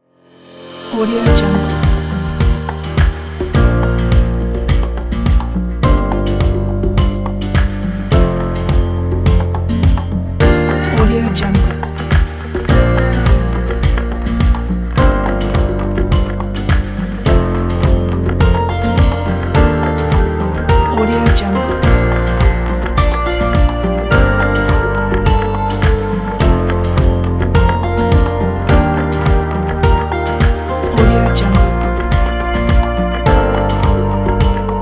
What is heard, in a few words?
acoustic inspiring travel